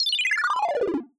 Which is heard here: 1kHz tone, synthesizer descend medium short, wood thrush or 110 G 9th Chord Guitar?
synthesizer descend medium short